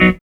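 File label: guitar chord; E-Lab; X Static Goldmine 1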